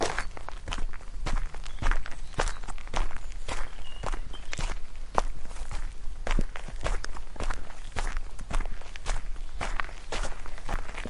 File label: gravel, footsteps